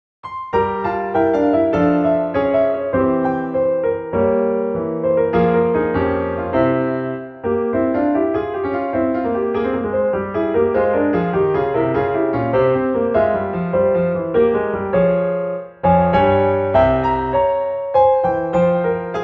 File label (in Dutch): pianobegeleiding